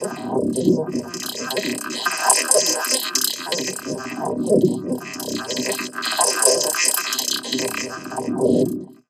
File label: inner vox, weird; Zero-G Ambiosis